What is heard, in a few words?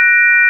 sine; ものづくり基礎工学（情報工学分野）; 音の波形と分析